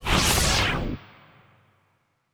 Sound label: Spaceship FX; Video Game; Cymatics; Video Game Sample Pack Vol 1